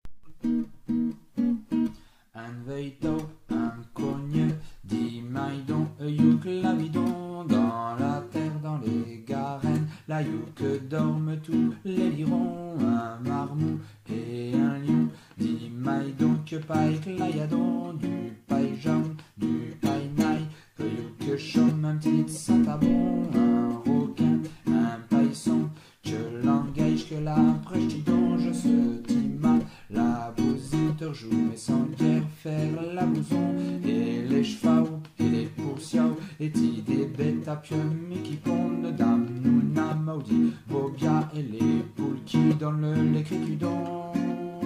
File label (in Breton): le chant des animaos